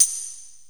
TAMBU HALL, percussion; drum

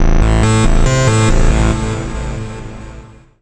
TECHNO EXTRA 140

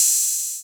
808 OP-HAT, TR-808 DRUMS

